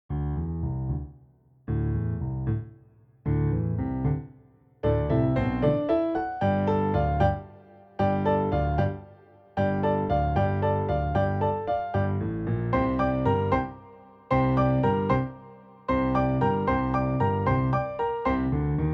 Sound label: Duet Jazzamatazz